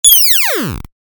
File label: Gummy Sweep Ringtone; ringtones